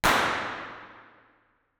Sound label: Binaural IR